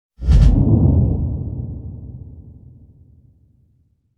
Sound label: Whoosh; FRANCE3; PETANQUE; HABILLAGE